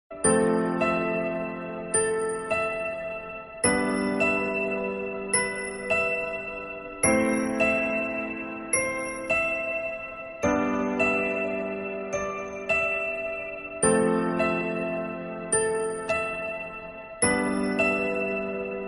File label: Instrumental Music Ringtone; Interstellar